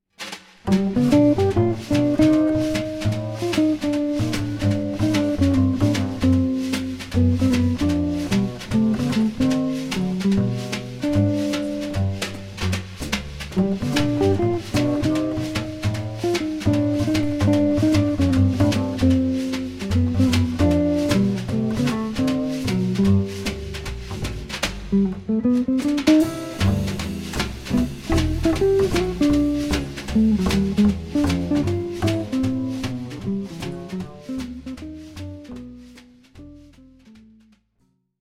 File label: drums